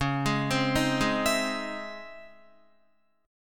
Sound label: C sharp Minor Major 9th, Guitar; arpeggiated; 4F,4A,5C,5G,5C,6E; 8,11,12,8,8,12